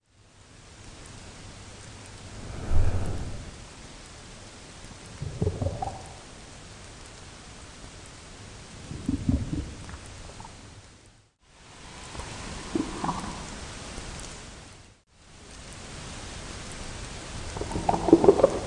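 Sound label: gray whale; Salish Sea; onc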